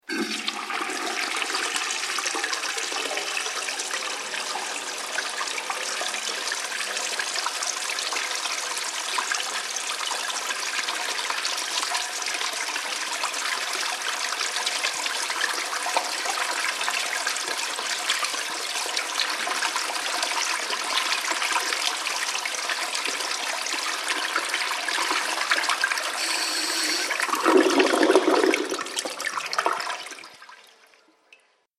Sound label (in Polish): spuszczanie wody w toalecie